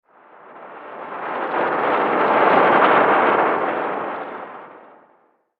Last Stop Disturbia, scary soundscape, horror sound effect